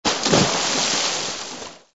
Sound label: jump in water